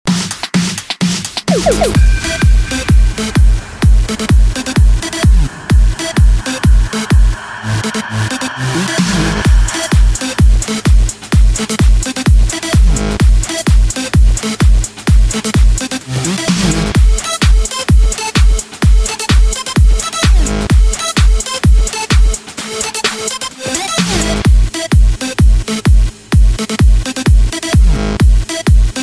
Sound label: Electro Bass